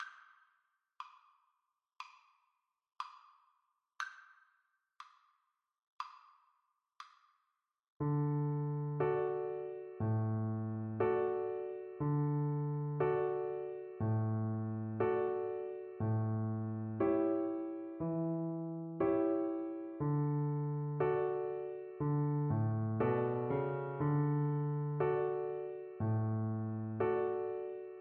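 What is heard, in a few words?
VLC karaoke, Cello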